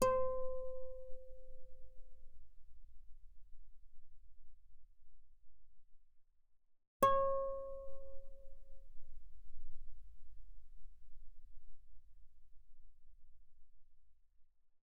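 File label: Guitar fortissimo sul G C5 Db5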